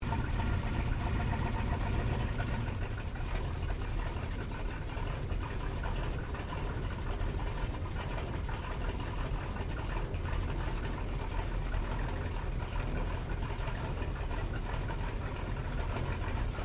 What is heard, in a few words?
AIRCRAFT 1916 SOPWITH PUP REPLICA IDLE TAXI INTERIOR STEREO; Replica of a 1916 Sopwith Pup; Airplane; Vehicles; Aircraft Collection